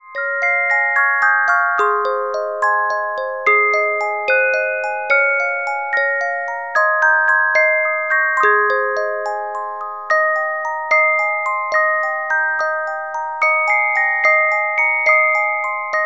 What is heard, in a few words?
Carillon Music Box Melodies